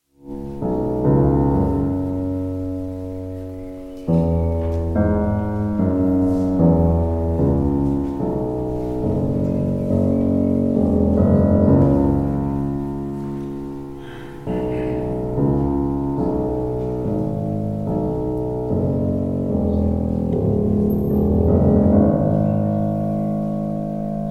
piano trio in a minor; maurice ravel

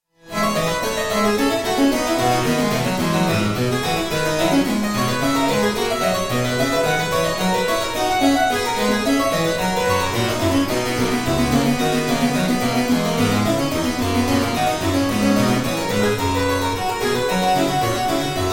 suite no 1 in a major for keyboard

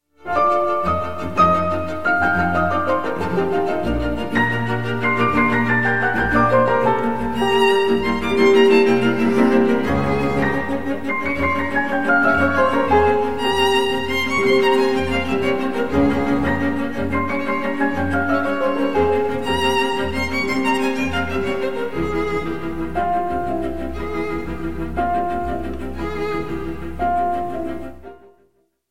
piano quintet in A major; franz schubert; d 667; the trout

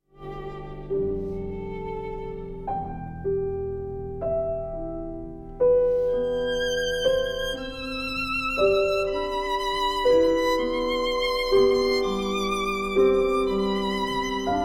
sonata for violin and piano; Aaron Copland; 2nd mvt

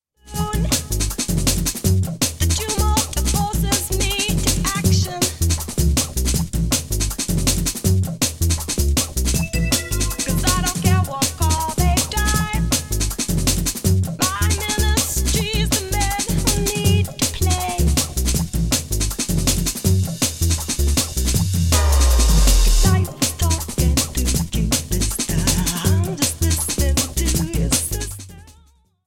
breakbeat era

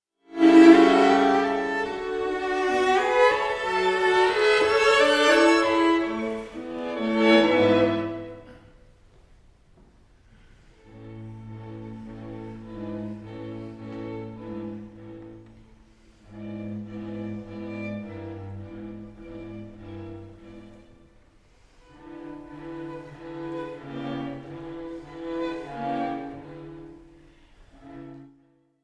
string quartet in c minor; ludwig van beethoven; op 131

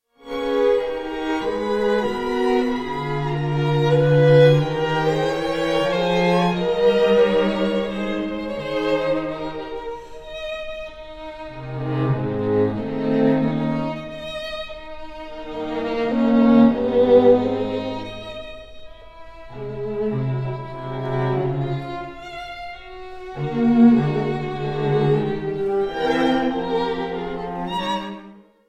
quartet in e flat major; wolfgang amadeus mozart

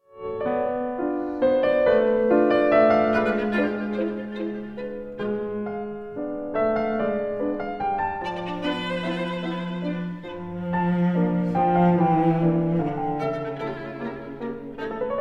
quintet in g minor for piano and strings

